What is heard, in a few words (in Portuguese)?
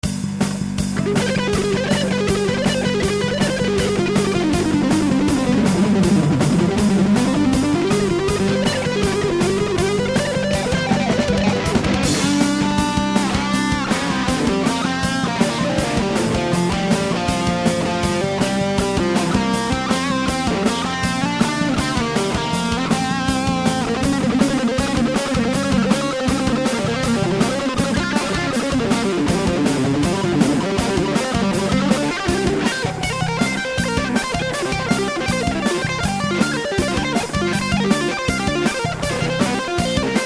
corda velha